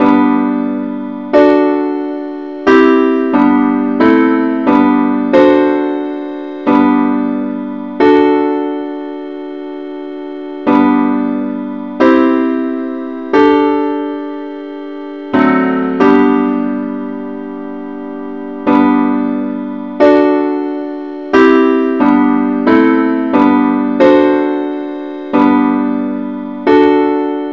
simple Acc; gwalchmai

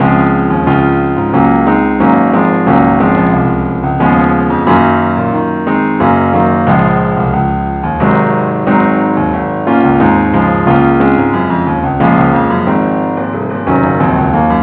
keyboard only key Des; i need thee

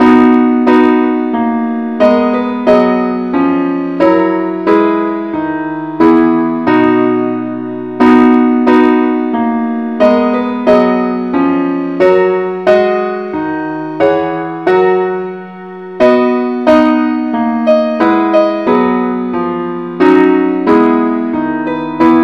partsonly keyb

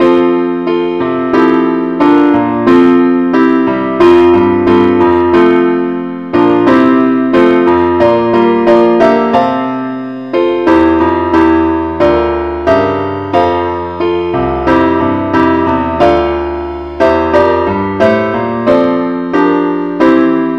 keyboard only, key F; christe Sanctorum

